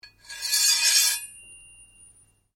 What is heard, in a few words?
Blade Scrape Slow Ring, Sword; Foley sounds for melee weapons; Swords; Combat